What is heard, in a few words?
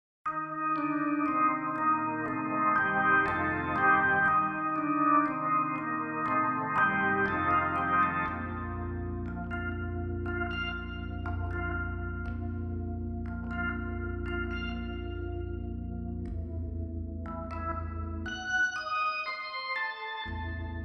PCM91 Classic Chapel DB33; Redline